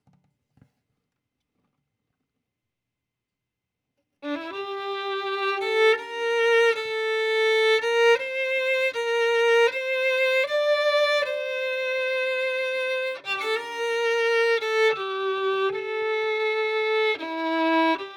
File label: Violin; Verdigris Music